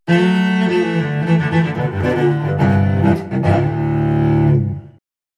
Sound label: Poor Folks Cello